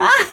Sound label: Vocal Oneshots; Vengeance Samples; Vocals